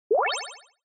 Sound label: SNES Action Item